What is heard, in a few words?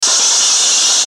static high; Static High Static Scrape